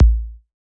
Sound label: gliss down, Kicks; single hits